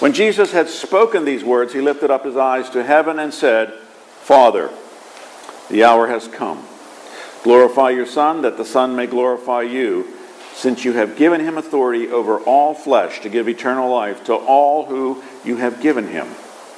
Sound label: Gospel